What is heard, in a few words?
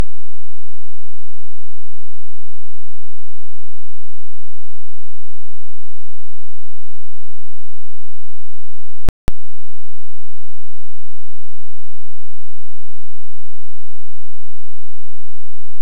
Hydrophone; OneOcean